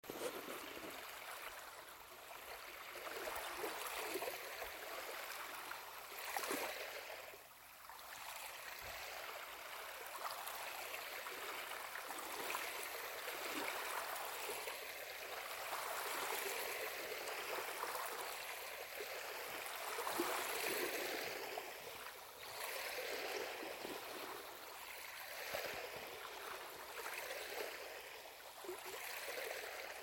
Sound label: waves